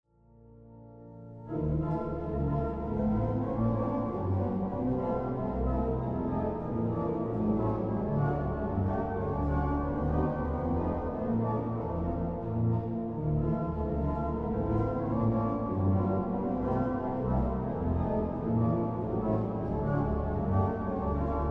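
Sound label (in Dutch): Koraalfantasie, orgel; De Heer Is God En Niemand Meer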